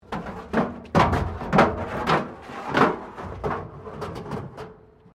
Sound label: METAL BIN SCRAPE PLASTIC CRASH BARRIER